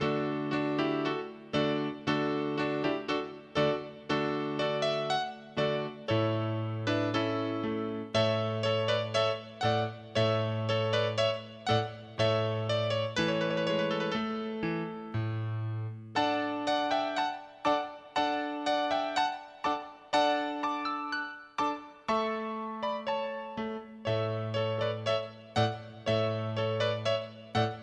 piano; Casino Inc

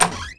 Door Open; Neighbours From Hell Console Edition; GC, Xbox

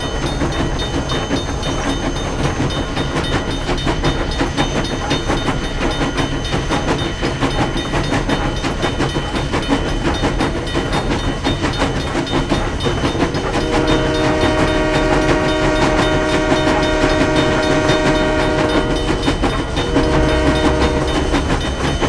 Train; leisure suit larry magna cum laude

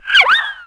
Swish

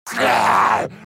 Monster Yell

